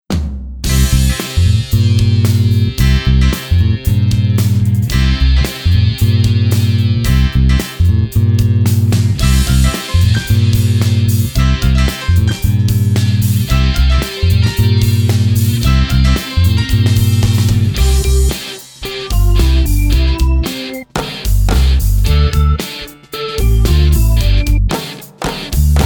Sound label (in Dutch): Drumming instrumentaal